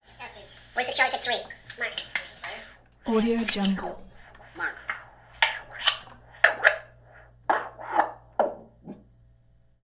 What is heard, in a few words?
syncing dailies film rewinds